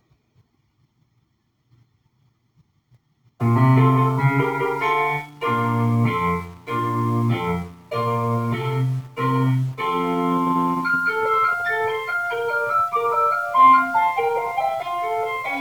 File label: Bass Solo